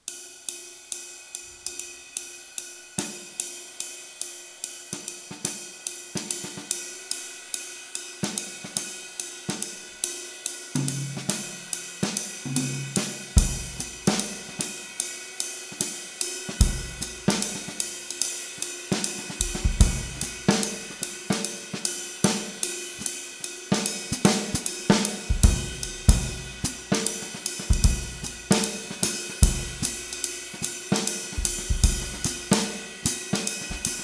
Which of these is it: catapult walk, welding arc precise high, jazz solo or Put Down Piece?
jazz solo